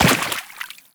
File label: Step Water, Footsteps; Warframe; Teshin